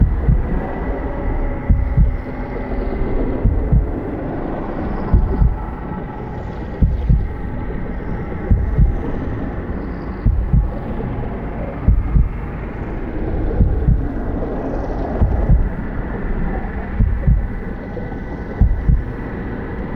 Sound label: Pre Death Loop; Warframe; Tenno